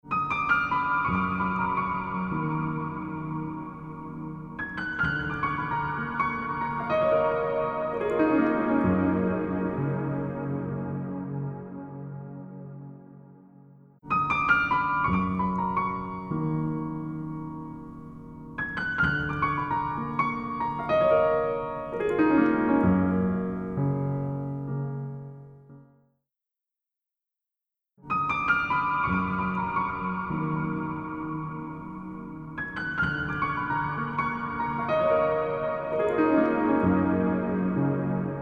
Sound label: Piano; H9 Bundle; Plug-ins: ShimmerVerb (Mountains) + Blackhole (H8000 Blackhole)